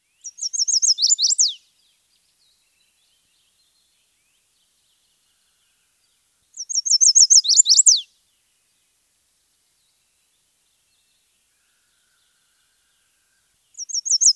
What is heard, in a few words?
Chestnut-sided Warbler; Birds